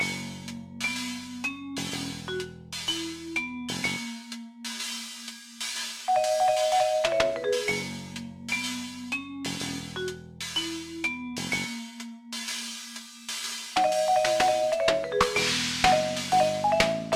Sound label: ELEVATOR; gungodz